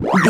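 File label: retro robot droid beeps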